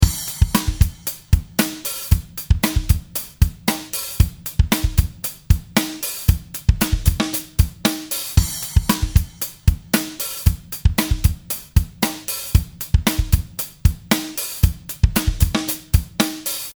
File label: Drums Clean And Bright Acoustic